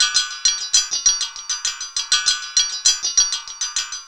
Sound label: CLANGEROUS; Rarefaction; Poke in The Ear With A Sharp Stick